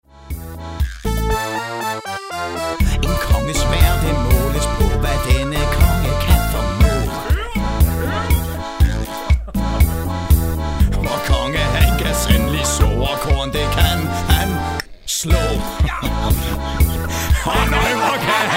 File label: Tango